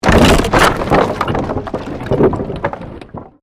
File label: created wooden structure crash medium